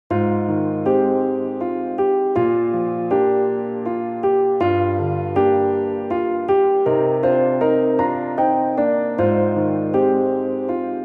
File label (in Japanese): ピアノソロver.